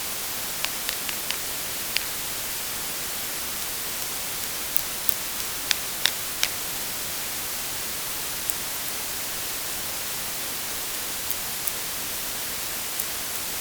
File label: wrench